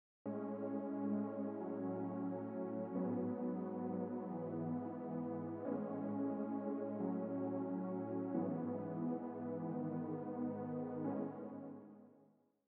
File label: Pad